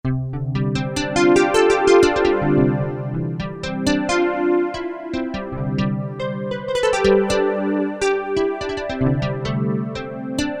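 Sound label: Electric Harp, Korg MSC-6S Fretted Insts; Fretted Insts 1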